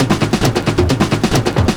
PERCS, 70 s Breakbeats

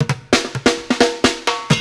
Street Groove, Ambient Grooves

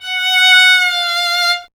STR F#5, Solo Instruments Vln; Miroslav Vitous

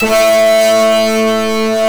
SWEEPS; NorthStar - The Wizard VOL-2; TURBOTRONIX

